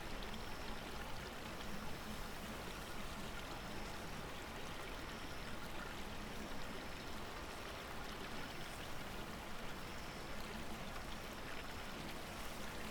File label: outdoor rotenburo night